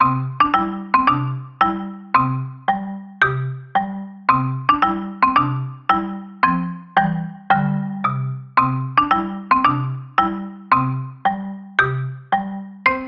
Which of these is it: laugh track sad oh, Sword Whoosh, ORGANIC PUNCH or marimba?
marimba